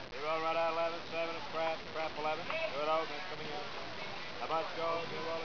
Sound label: craps; 10,000 Graphics Pack Volume 1; Media Graphics International